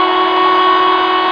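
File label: elevator; MOTS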